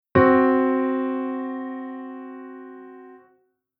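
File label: Chord Interval augmented 4th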